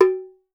BRASS MAM, COWBELLS; Voice Crystal - Steve Reid Definitive Percussion

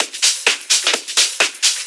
Discohouse Loop 128 BPM

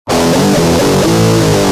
G Jam, Guitar; Industrial

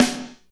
snare, R&B Snares, Roland; Northstar - Drumscapes Roland

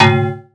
PERC FX

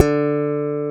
POP D3, bass; AKAI S6000 CD-ROM - Ultimate

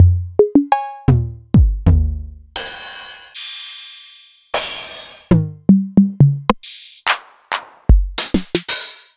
Novation Drumstation

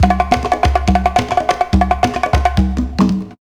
solo those bongos; Zero-G Deep House; 140bpm

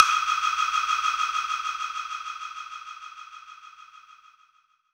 Long Block, Mixed Percussion; Zero-G Jungle Warfare 1, 2 & 3; 90 s Sample CDs

